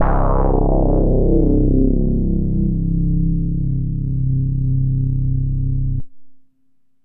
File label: ARP2600 BASS Reaper Low - C; Synth; One Shots; Cymatics - Fantasy Synth Sample Pack